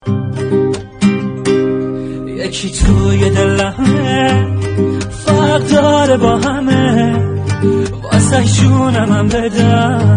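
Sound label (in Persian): Ba Gitar, with guitar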